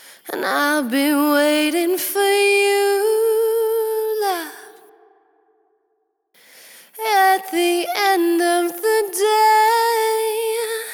vocal voc

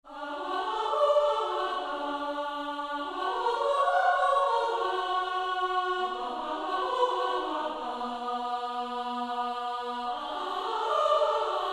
legato, choir; Strezov Choir Essentials

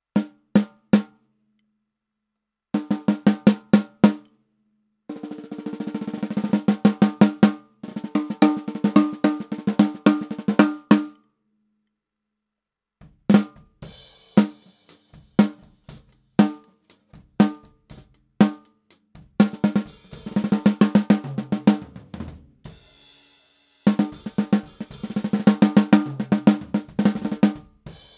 snare

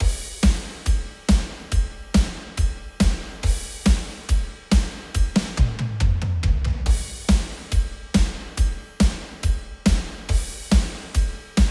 drums

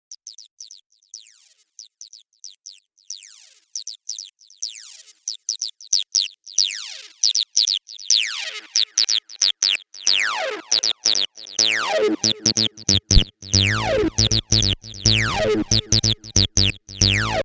hipass; baseline

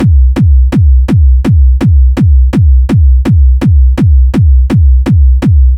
kick loop 166bpm

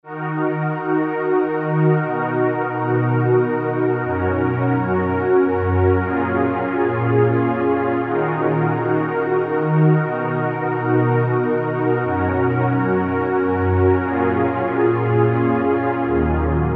pad

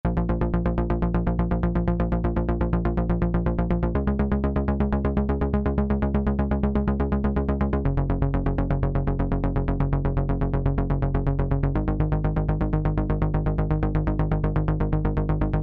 bassline vital; serum vital

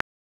logic click up; Logic Pro Klick

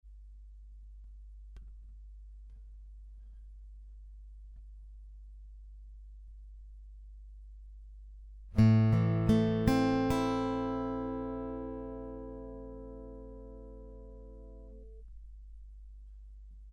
pickup

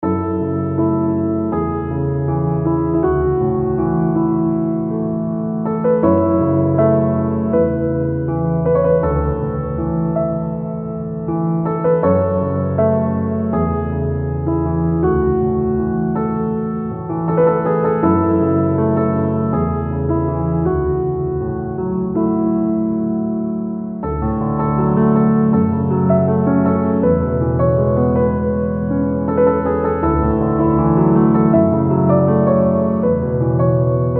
steinway b dark, Klaviermusik; EWQL

